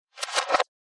Magic Paper Movement; SoundsCrate

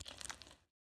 squishy short; soundscrate

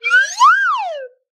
slide whistle short crescendo

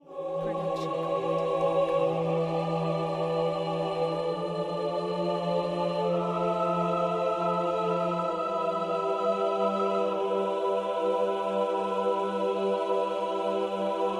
epic choir; soundscrate

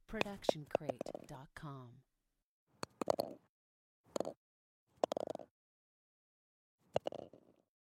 golf ball fall in hole; stereo; soundscrate

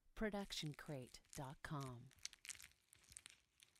squishy long; soundscrate

